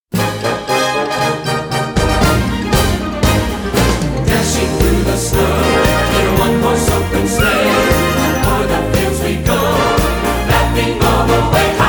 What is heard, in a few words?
Jingle Bell Medley Jingle Bells vocals; Sawgrass Elementary